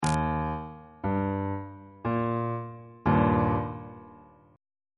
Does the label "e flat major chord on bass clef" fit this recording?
Yes